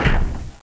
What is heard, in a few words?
CLUNK; Gunlok; Robots